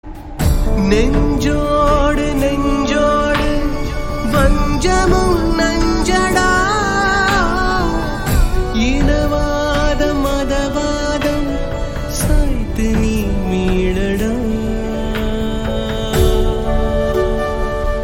Theme Bgm Ringtone; FIR

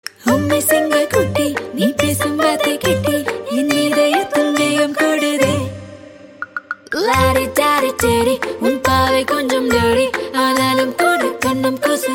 Female

